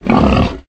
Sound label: boar idle; monsters